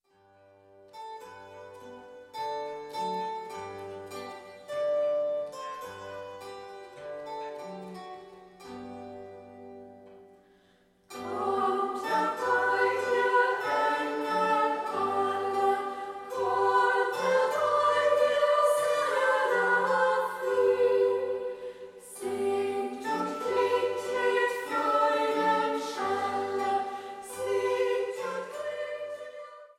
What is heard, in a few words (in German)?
Sängerinnen; Kommt herbei ihr Engel alle